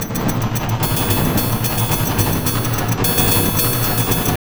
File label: tabla, 110 bpm; Zero-G; Pure Tabla